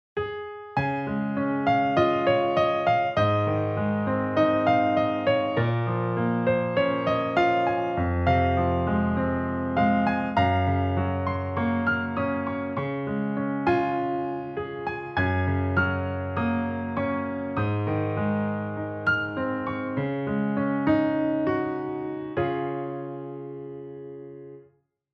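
uspokaivayushij ritm krasivogo fortepiannogo fonovogo zvuka, calming rhythm of beautiful piano background sound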